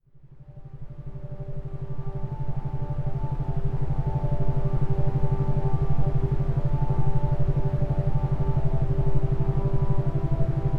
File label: Brainwave Shots, Brain Power Shot